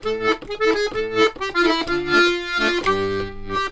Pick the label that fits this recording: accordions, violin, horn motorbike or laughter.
accordions